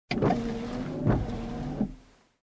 Car Windshield Wiper

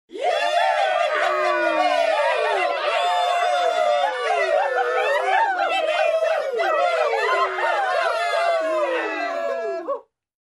Crowd ecstatic and cheering

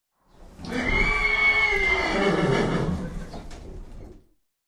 Horse Bray

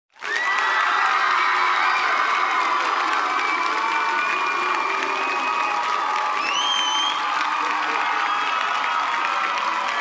Herd Cheering Whistling and Shouting

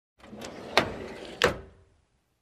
Opening Drawer

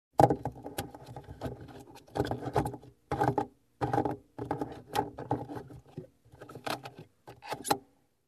Stowing away things in car

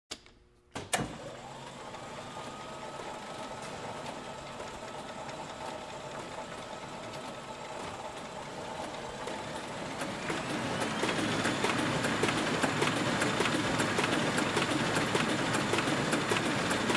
Industrial Mixer Machine Turned on

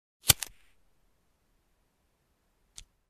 Lighter click

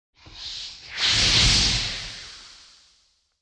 Flames lighting up

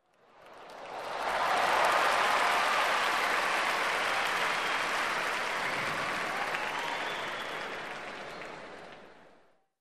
Large Audience Cheers and Applause

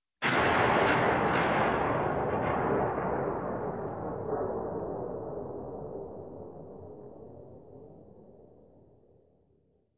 Building blow up distant

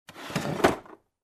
Sliding Door close

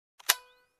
Camera Rewinder click sound

